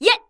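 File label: MON ATTACK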